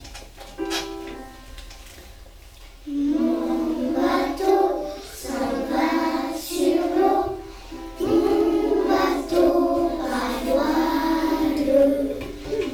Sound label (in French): bateau voile